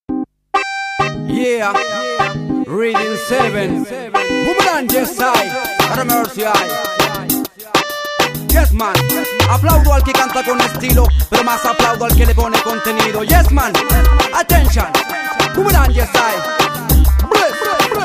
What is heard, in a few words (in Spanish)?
aplaudo